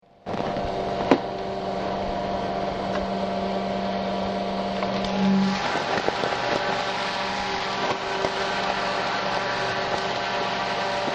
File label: fan